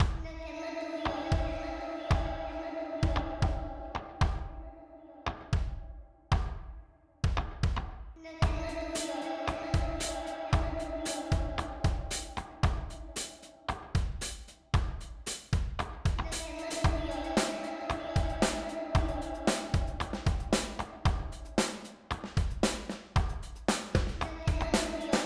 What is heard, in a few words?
DRUM